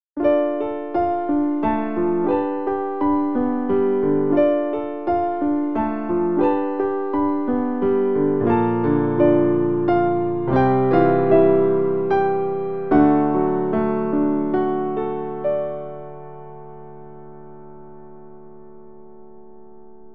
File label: Serenade of Water Piano; The Legend of Zelda Ocarina of Time